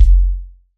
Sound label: ELECTRO, KICK + SNARE; Best Service Hyper Dance